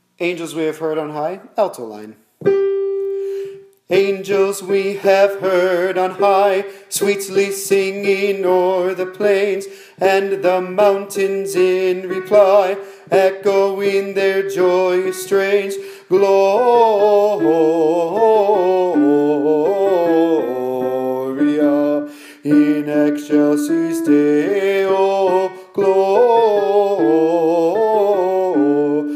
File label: alto angles we have heard on high